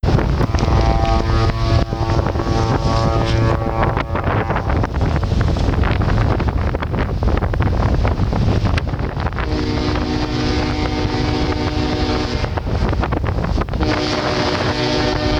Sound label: sirens, Norway; Norway Winter; norway february 2018 journey